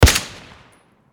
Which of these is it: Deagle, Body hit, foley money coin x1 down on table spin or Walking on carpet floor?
Deagle